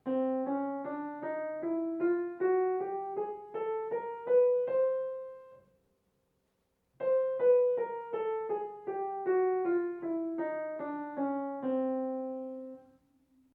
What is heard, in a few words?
chromatic scale